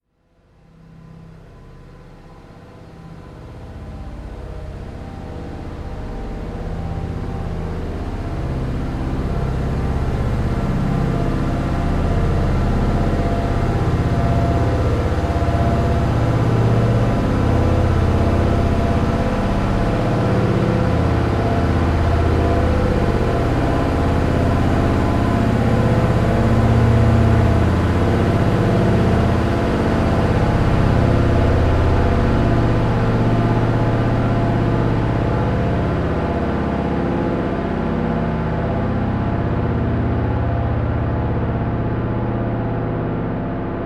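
low sound gradually increasing and decreasing volume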